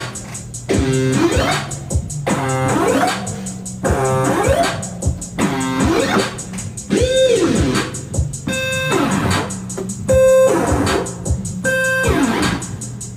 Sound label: goofy jolly beat